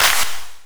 Clapsnare; Kicks and Claps; Out Of My Mind; Vengeance Samples

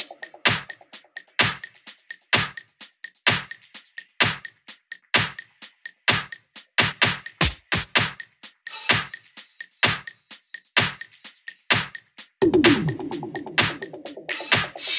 House loops 128BPM; Vengeance Samples

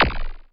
Percussive FX; Vengeance Samples; VEH1 Percussion

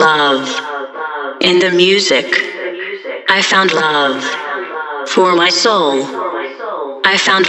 House Loops, Vocal Loops House 128BPM

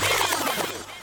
Vinyl FX and Scratches, FX Scratch

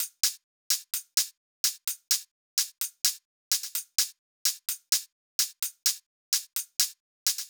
128BPM Drums; Vengeance Samples; Uprising Kit

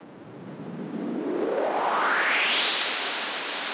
128BPM Noise 2Bars, Clean Noise Ramps; FX Fills; Vengeance Samples

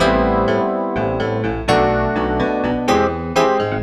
pne, piano loops; house; Vocal House; PS-28 Vocal House Samples; instrumentloops

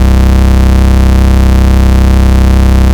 Ultimate Bass Root C; Vengeance Samples

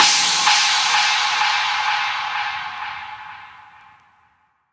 FX Downlifter

